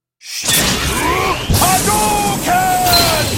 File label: Acapella